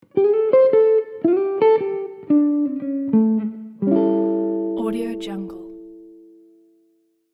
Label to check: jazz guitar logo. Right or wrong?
Right